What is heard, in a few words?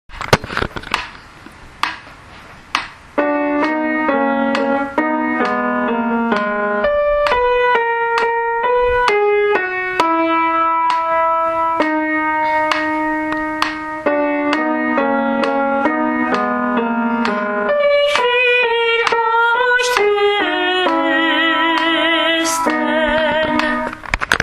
soprano; Brahms requiem allemand; prise de note